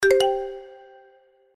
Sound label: collect click